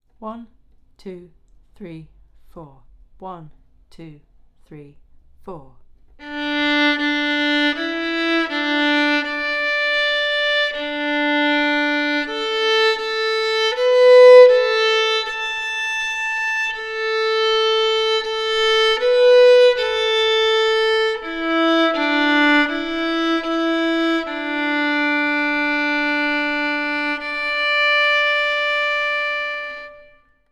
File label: Harmonic Warm up, violin